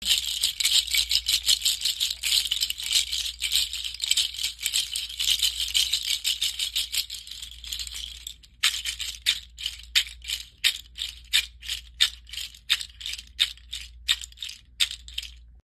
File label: Bendo Seed Rattle